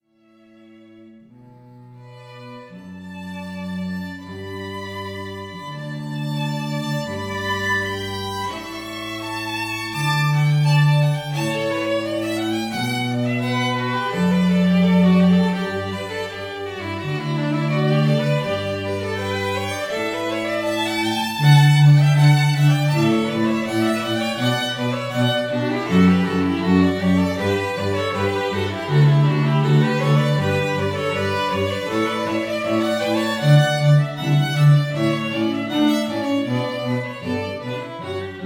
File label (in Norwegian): Canon Pachelbel trio